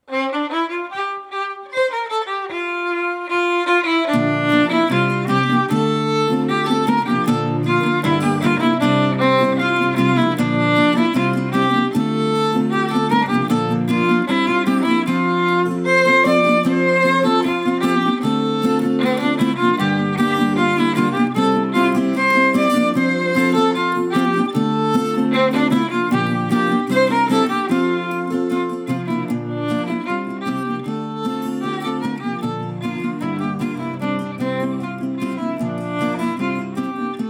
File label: instrumental; Auxois; I-vas-ai-la-fontaigne